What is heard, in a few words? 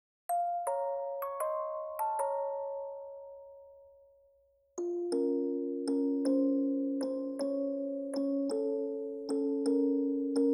game over; Bildschirmflausch-LD41